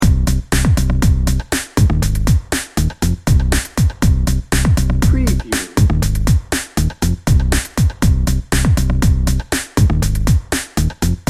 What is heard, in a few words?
energetic motion bed